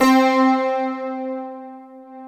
POP BOARD; East West; Global Sounds; VFX-SD

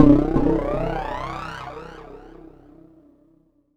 C1, SynthLine

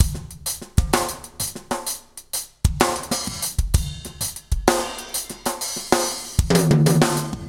herri drum; Soundengine - CSR-000 The Art of Transwaves; PERC WAVES

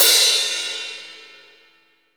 Crash Cymbal, MONO CRASH; M20 CRS1 MPC

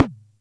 Music Percussion Computer Tom, Drum Machines

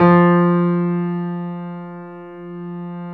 PIA Grand; Sound & Vision; Gigapack I CD 2; PIA Grand 4

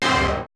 perc, Roland MC-909

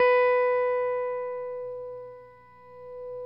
STRATOCASTR

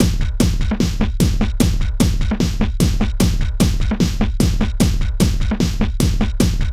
Techno; INIS; Funky Xtreams

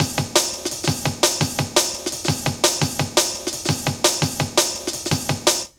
LOOP, Jungle

